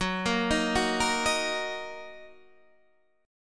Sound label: D sharp Minor F sharp